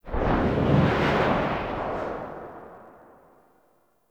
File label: Launch Whoosh; Rail Jack Dock